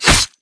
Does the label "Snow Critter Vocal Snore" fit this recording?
No